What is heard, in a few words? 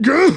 pain; radditz